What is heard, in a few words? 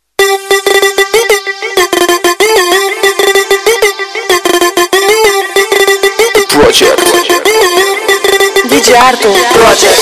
Trance Energy Loud